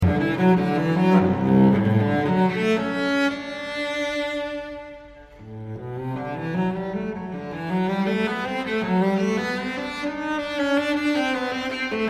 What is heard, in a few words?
Cello Suite No. 1 in G Major, Prelude; BWV 1007